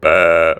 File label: beeehh